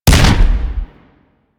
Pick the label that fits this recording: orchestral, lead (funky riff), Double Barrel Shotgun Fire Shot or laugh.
Double Barrel Shotgun Fire Shot